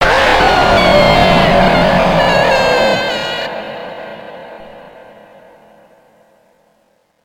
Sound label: jumpscare; five nights at black 4